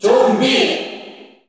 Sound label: Cheer Korean; Zombie; SSBU